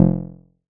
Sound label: BAS F1, Yamaha TX81Z synth bass